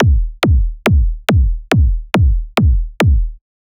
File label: hard hitting, techno